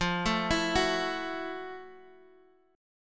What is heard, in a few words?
F Major 7th Flat 9th; arpeggiated; chord; FM7-9; JGuitar